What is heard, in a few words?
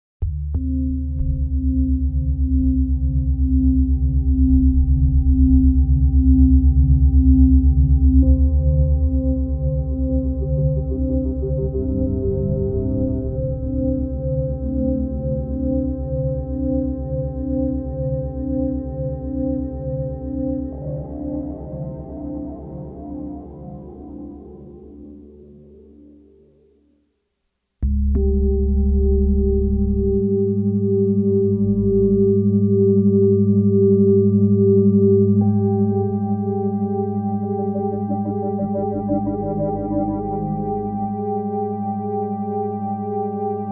drum; jericho